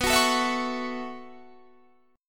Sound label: B 9th Suspended 4th B down; strummed